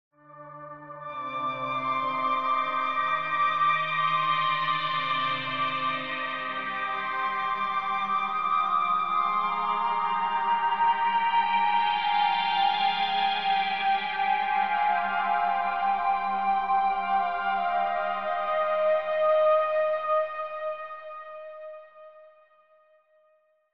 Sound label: Playback Keyboards